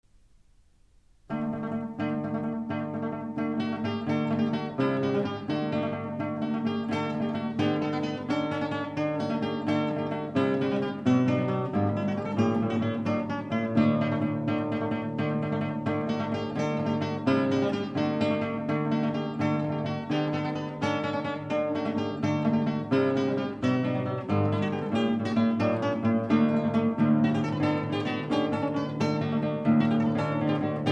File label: Guitar, Danza; Danza no.1